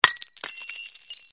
Звуки бьющегося стекла посуды бутылок окон

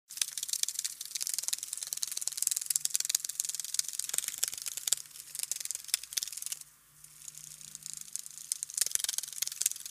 Звук хлопанья крыльев мотылька

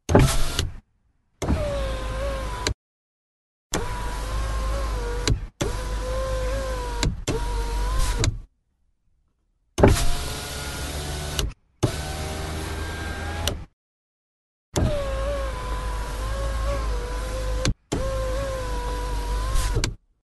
Звук открытия и закрытия окна

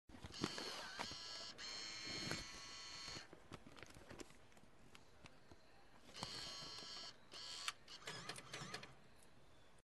Звук банкомата который принимает купюры

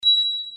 Звук приема вызова

